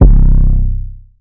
Square 808, C; 808s; Cymatics; 2022 Melody Mystery Expansion

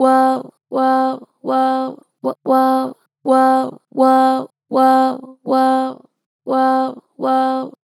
"Woah", Harmor Vocals, Pitch Flat Strong

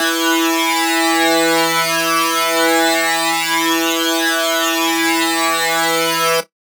Lead Shot E Alien, Dubstep; One Shots; Ghosthack; Dubstep Sound Arsenal

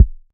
Kick, Dubstep 808 Kicks; GhostHack

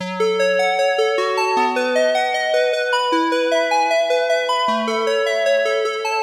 Happy Melody Loop - 154 BPM E Maj Lead; Melodies; Loop Stems; Cymatics; Oracle Sample Pack

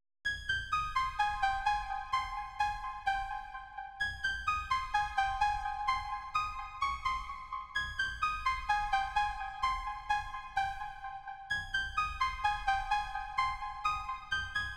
128 BPM F Min Lead, House; Loop Stems; Cymatics; Breakdown Loops; Sunrise

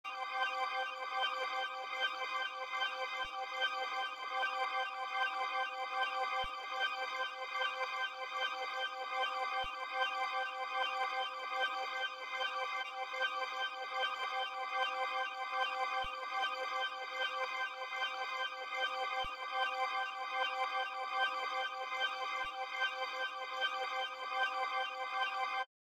150 BPM C Min High Synth; Trap; Melody Loops; Loop Stems; Cymatics; Nightmares; Abyss

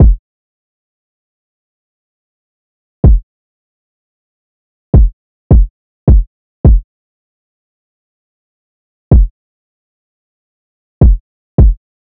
Drum Loop 158 BPM Kick, Hip Hop; Drum Loops; Cymatics; Hip Hop Sample Pack; Lightning Drum Loop

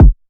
Kick F#; Drum One Shots; Kicks; Cymatics; Eternity Sample Pack

